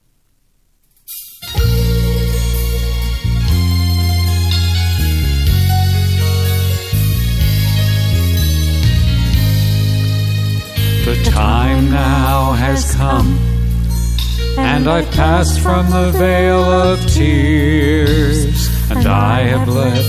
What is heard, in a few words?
Up Here Singing